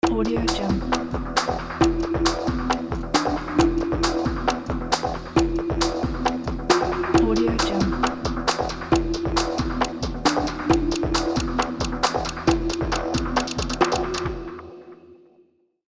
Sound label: quiz countdown ident